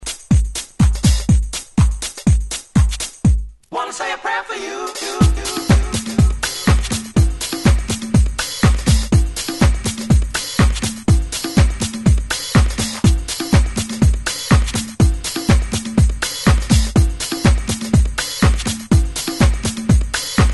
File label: Rhythm sounds